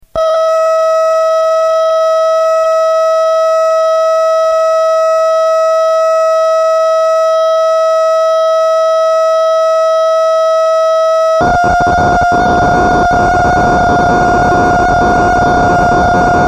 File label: siel op6 factory